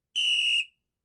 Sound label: referee whistle